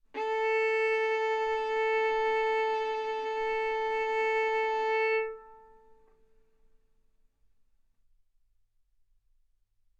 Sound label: Violin arco mf sul A A4 B4 stereo; single mic